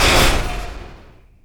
goob fire mono, weapons goobgun; Quake 4 Alpha

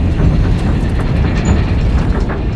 machine grinding; Quake 4 Alpha

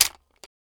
Shotgun Dry Fire; Foley; Doom

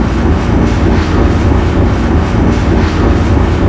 cryo big fan; Quake 4 Alpha; id software

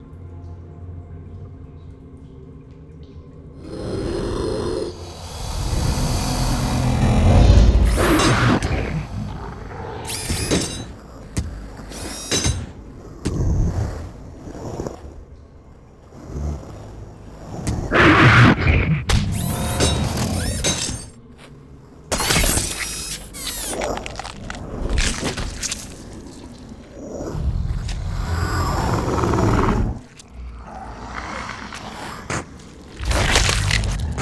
bathroom mono; Doom

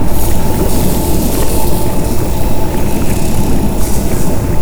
bubble machine; Quake 4 Alpha

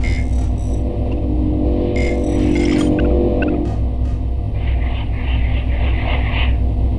computer console, ambience computers; Quake 4 Alpha

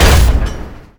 tank step, strogg; Quake 4 Alpha

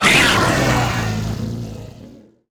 imp die, monsters; DoomRTX; imp5

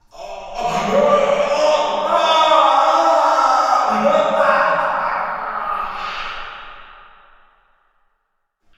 prison pain scream; Quake 4 Alpha; id software

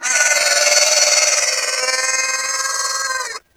retch death; Quake 4 Alpha; id software; strogg

